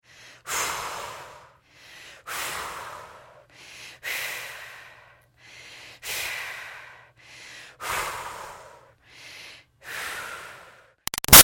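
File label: Human Female Breaths Exercise Cool Down; Breathing; BigRoomSound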